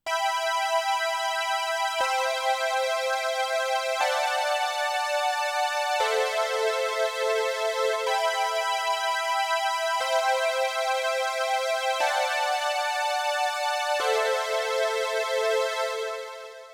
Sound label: synthwave